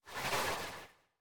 旋风人滑动声

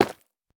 下界矿石破坏声

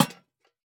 灯笼放置声

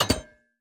铜门开关声

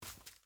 幼年海龟爬行声

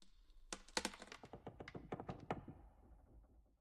苍白垂悬苔藓声

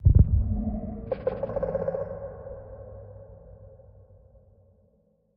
监守者接近警示声

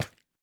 蜡烛踩踏声

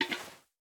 细雪桶倒出声

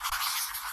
制图台绘制地图声

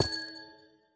紫水晶簇放置声

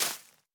樱花树叶破坏声

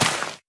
甜浆果丛破坏声

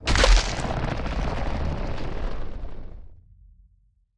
嘎枝生成声

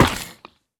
海龟受伤声

